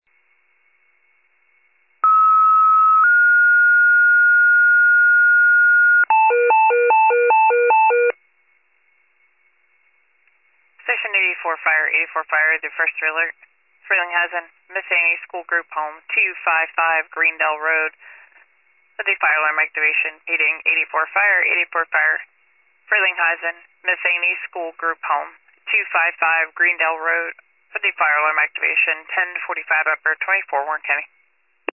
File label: fire, pager alert